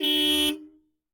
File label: horn car; Blind Drive